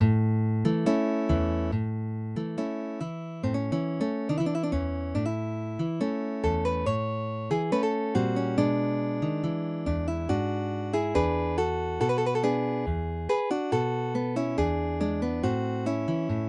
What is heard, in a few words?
tango duet; albeniz